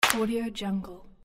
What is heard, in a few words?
clap